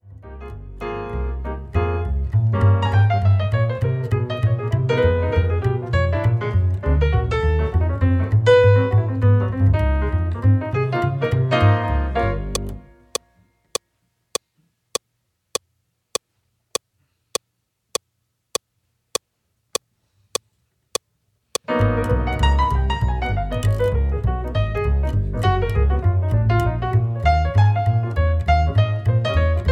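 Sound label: sample Woody Piano Bass Click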